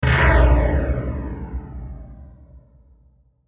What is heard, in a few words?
Hit Airy Phaser; Sound Design